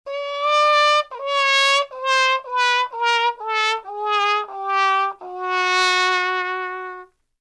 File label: trumpet wah wah wrong answer comedy